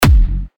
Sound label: TECHKICKS F#, techno kicks; Tekshock; revealed techno kicks vol 10